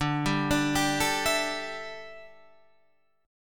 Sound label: D Suspended 2nd Suspended 4th D down Guitar Half Step Down